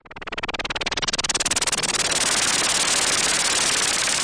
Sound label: noise, Noise Sweeps